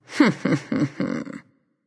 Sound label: hehheh; Smite; bellona